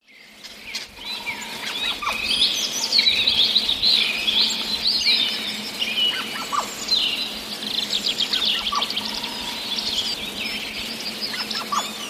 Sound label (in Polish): sojka; trzysylabowe, niezbyt głośne zawołania